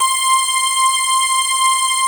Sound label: basses; Wizoo - Powered Wave